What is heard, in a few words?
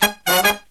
Killer Brass Riffs, SOUL; USB Soundscan vol.29 - Killer Brass Riffs; AKAI